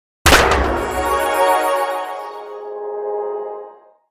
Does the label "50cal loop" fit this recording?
No